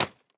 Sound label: plastic hit